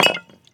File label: weapon molotov impact; physics; weapons